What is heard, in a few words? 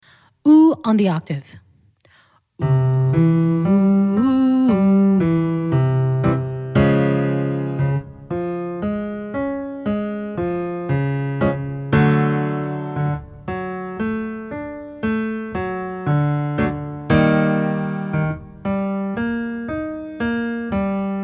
octave oo low range, vocal exercises